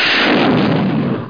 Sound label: Doom 2 Explosion